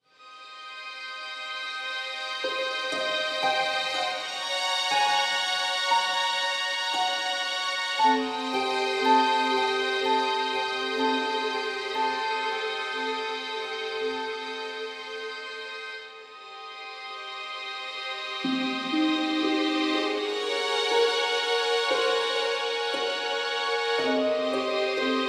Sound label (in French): Orchestrale Narratif